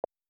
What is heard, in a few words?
touchscreen button tap; VideoPoker